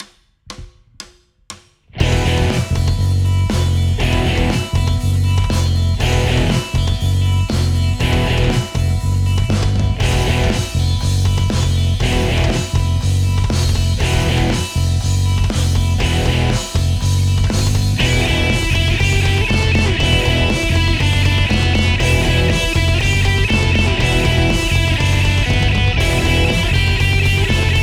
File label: Example Heavy Backing; Die erste Übung